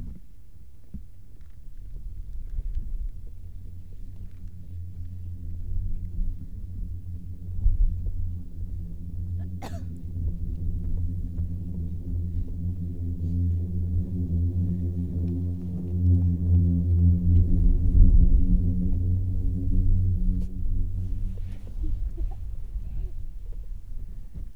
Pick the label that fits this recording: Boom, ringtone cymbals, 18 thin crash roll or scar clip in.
Boom